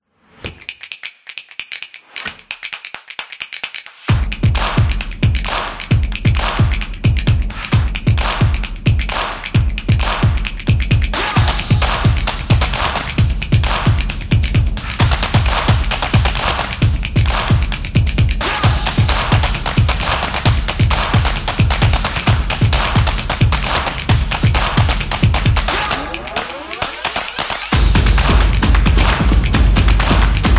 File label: Celebration Background, drums energy